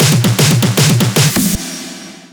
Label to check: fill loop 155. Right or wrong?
Right